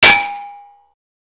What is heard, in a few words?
metal; Mafie 1